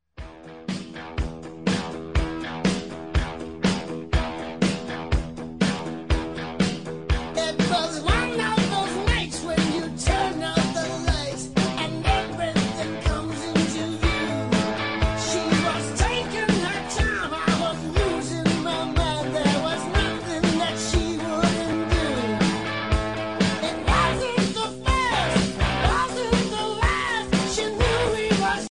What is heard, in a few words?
rock ringtone; iphone 7